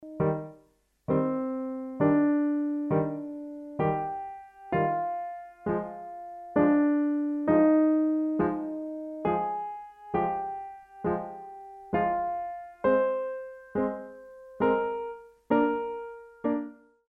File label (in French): improvisation, piano